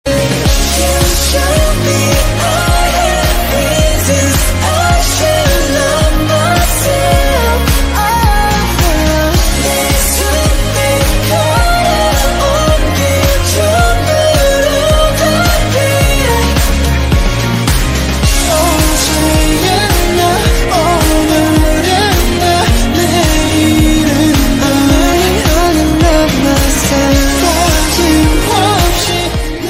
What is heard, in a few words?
korean ringtone